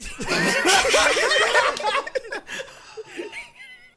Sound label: laugh track; Snapcube Sonic 06